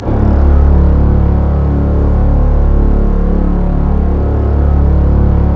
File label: STRING; Roland - LA Composer vol. 1